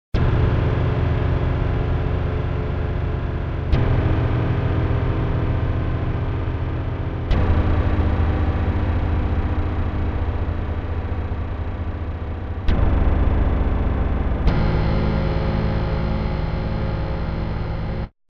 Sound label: drum, roland jv880; endworld